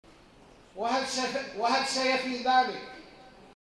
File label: loop